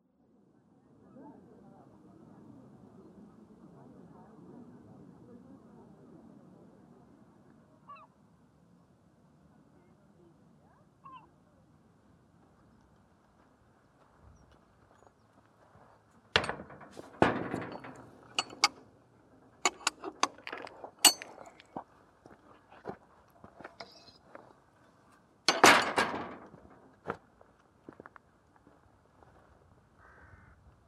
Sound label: Memorial Walk Soundscape; Mike Memorial Walk